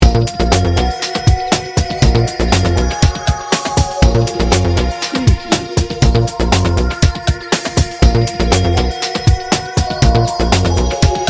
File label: adventure beat loop